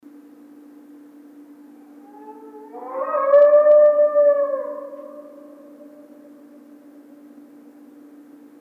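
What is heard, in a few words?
howl distant muffled wolf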